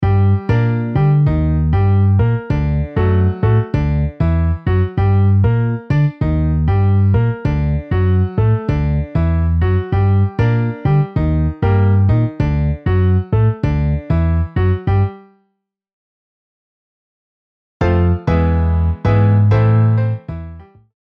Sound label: Piano Bass MIDI